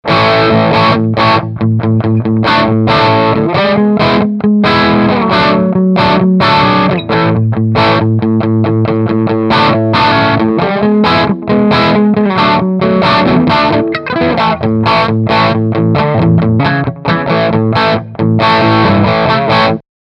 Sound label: Overdrive